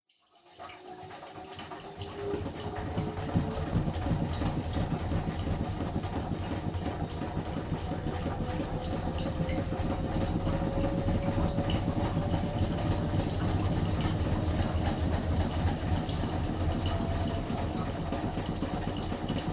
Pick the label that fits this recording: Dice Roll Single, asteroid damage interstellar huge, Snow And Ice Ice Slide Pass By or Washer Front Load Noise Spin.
Washer Front Load Noise Spin